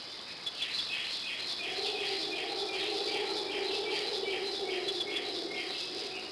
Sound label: jungle; Splashdown; XBOX; Soultree